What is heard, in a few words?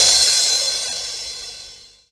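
D2 crash, crash reverse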